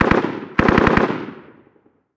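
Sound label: gun m240 distant; ModernWarfare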